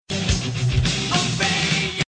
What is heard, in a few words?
Metal Sms Tone